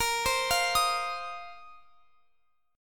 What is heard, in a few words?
A sharp Suspended 2nd Suspended 4th A sharp down Guitar Open F; arpeggiated